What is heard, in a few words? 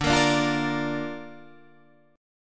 D Minor Sharp 5th, D